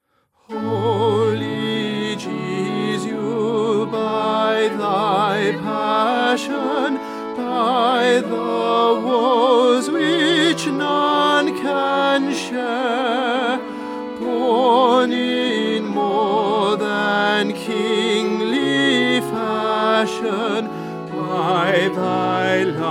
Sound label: Tenor; Stainer; Crucifixion